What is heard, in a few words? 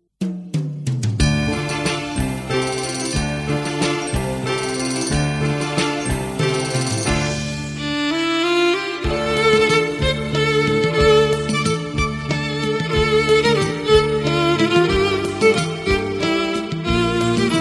Violin